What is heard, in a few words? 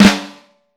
FLAM, Snare Drum; Vintage Snare Drum; 6X14; Q Up Arts; Johnny.C's Vintage Snare Drum